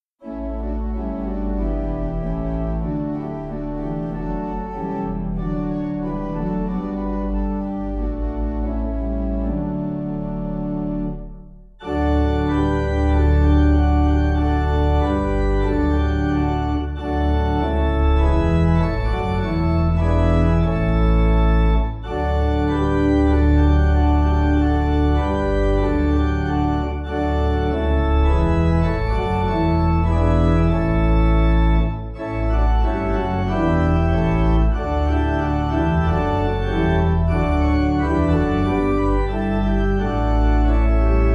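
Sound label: Pipe, Pipe Organ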